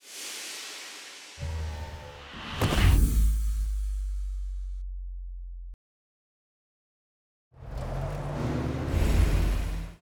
SFX; Jeep Empire Intro

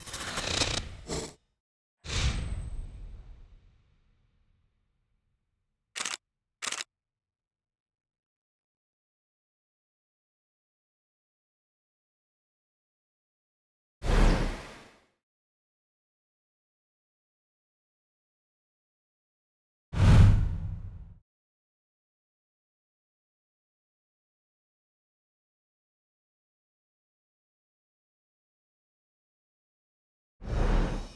SFX; Perfect Match Sneak Peek

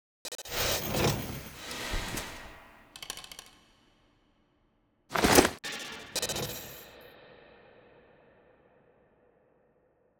SFX; BornAgainVirgin

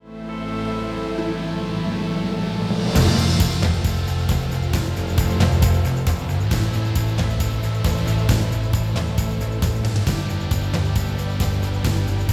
Music; Trumpet Awards 2013

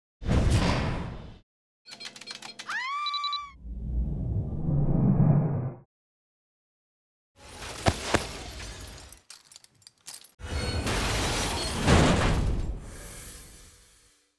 SFX; Star Studded Saturdays; Swordfish

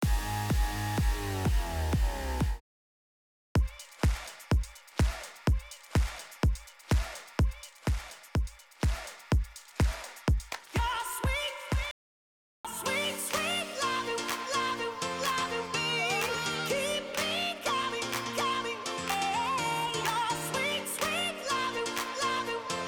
TEXTLESS MUSIC; Upfronts 2016; Dating Games; TVONE

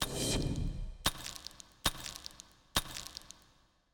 FX; Star Studded Saturdays

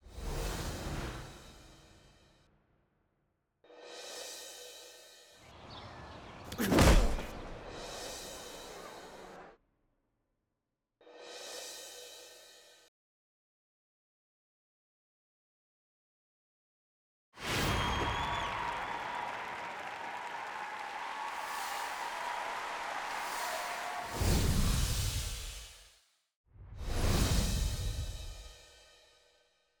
SFX; Easter Movie Combo

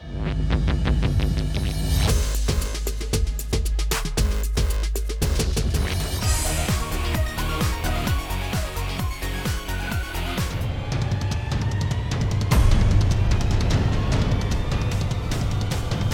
Music; Hollywood Divas

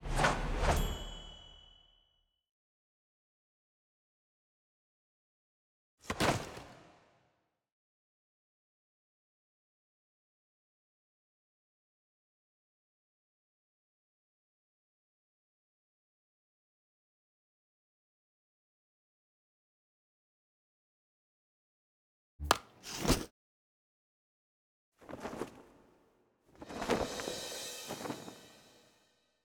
SFX; BornAgainVirgin

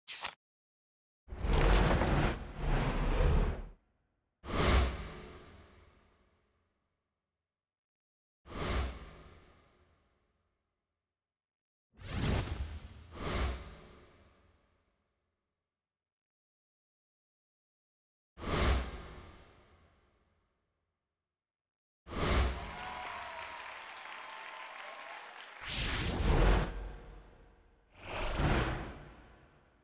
SFX; Front Row Seat

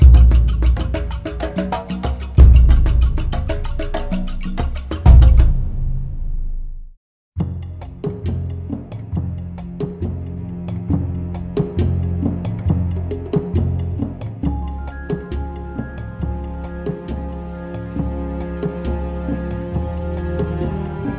Music; Roots In HD

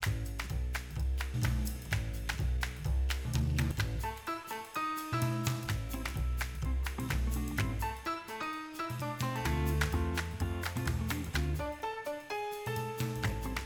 MUSIC; BornAgainVirgin

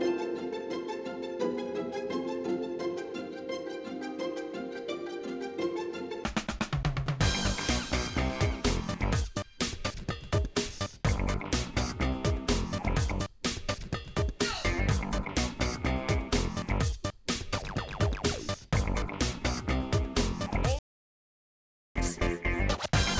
Music; WeekendWithTheFamily

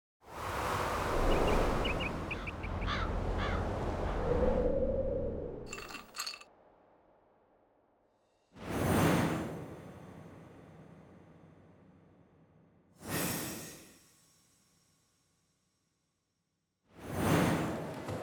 SFX; A Christmas Blessing launch

